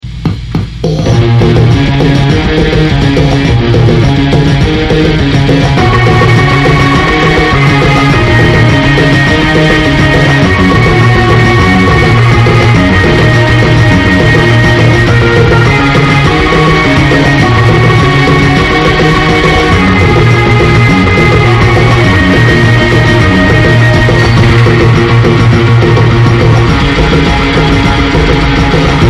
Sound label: Instrumental